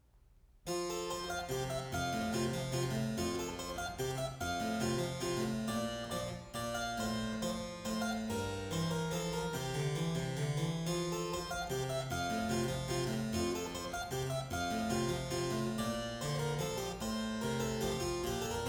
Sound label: Minuet in G Major; Bach; Anna Magdalena Bach Notebook (highlights); 尼古拉斯 · 麦克吉根